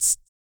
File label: TONGUE; Spectrasonics; Vocal Planet; Blues Soul